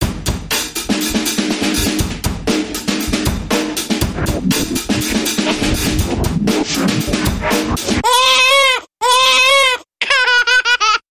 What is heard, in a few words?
Dance Bumper Reversed